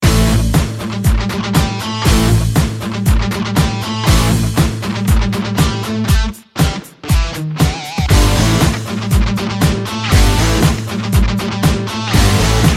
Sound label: ROARING GUITAR